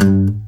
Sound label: pop, Guitar; 90 s Sample CDs; Quantum Leap - Guitar & Bass